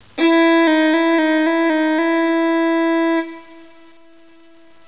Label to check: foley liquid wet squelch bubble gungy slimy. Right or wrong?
Wrong